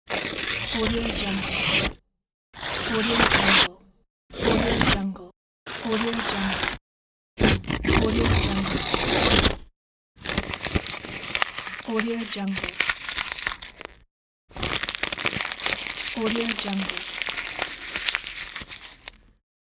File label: tear paper and crumple pack